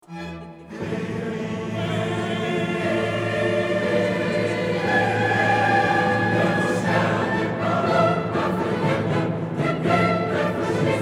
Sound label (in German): Chor Wehe ihm Er muss sterben